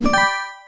match

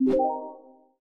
level Start

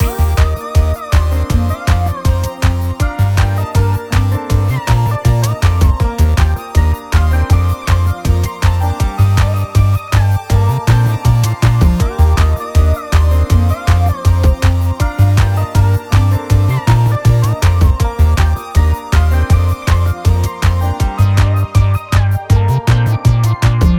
music

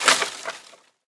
destroy tree

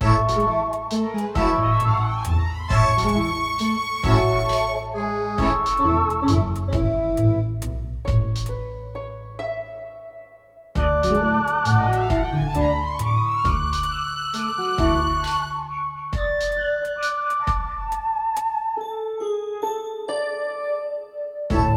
music

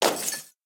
destroy ice; game

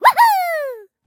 santa victory; game

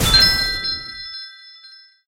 match

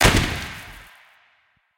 particles

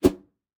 popup

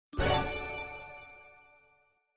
win star; game

